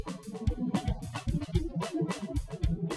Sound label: Latino